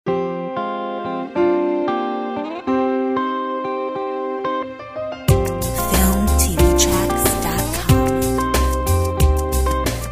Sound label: Rnb